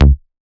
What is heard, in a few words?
Raw Bass D Up